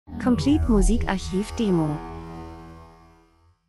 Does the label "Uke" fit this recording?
No